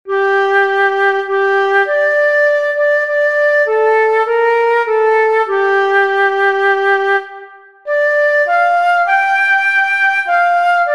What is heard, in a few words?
Scarborough Fair, flute solos